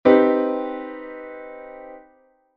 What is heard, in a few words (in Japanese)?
Cマイナーメジャー7thコード; ジャズピアノ